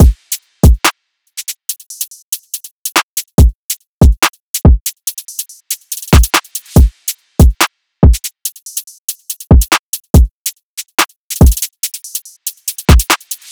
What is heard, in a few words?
Drum Loop - 142 BPM (Drill); Cymatics; PARADOX II; Drum Loop Collection